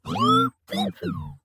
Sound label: beeps "You're welcome"; 堡垒（守望先锋2）